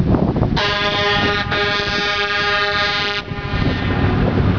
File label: railgrinder; horns